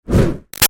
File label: Fire Torch Whoosh Fast Short; Flames; BigRoomSound